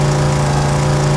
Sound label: engine running speed